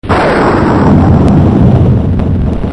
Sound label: Thunder